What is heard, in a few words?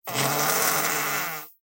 Fart Noise; SoundsCrate